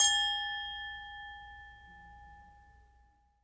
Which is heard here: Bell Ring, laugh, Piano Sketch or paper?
Bell Ring